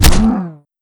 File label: axe hit flesh; weapons; tf2